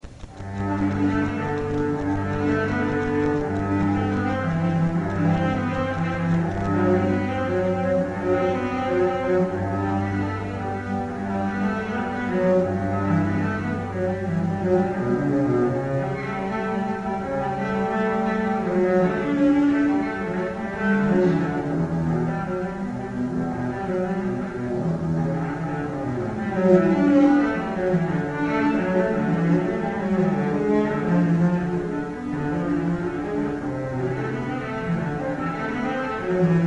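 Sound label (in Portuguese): acerto violoncelo; instrumentos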